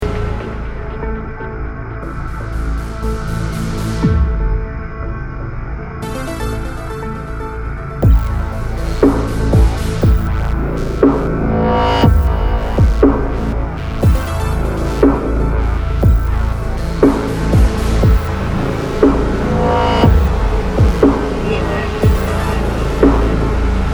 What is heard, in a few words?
desolate ambience